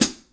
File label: HH PD, Drum 1 Dry; East Collexion